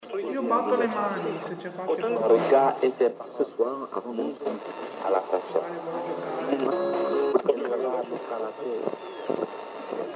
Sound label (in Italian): risata bambino e sì